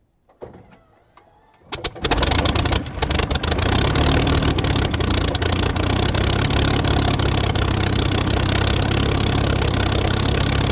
aircraft 1944 supermarine spitfire mk-viii startup